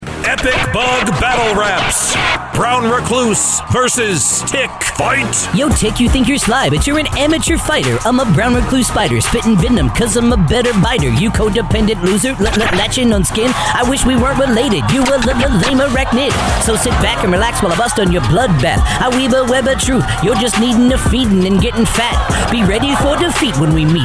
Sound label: Epic Bug Battle Rap; Steve's Pest Control